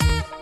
epic sax hit

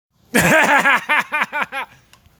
laugh; tweaker

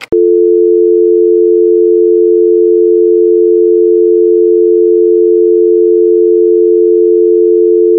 dial tone

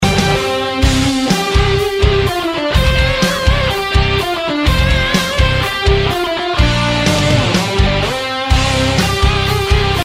rock; star wars

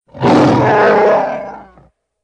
roar wookiee

